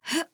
grunt lift; Skye

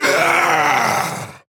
Taking Damage; Drogoz Dreadhunter

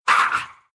pain, ru; dota2

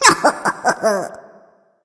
Eager laugh; Ratatoskr

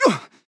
Grunt Hit; paladins; ShaLin

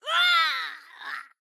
Scream; Tracer; overwatch

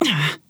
grunt hit

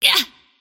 pain; antimage

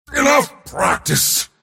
last hit pain; Mars

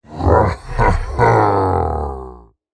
Ravager celebrate; minecraft

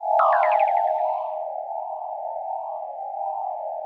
Tether loop

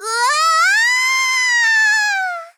Falling; Mage; Paladins

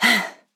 Grunt Jump; Inara

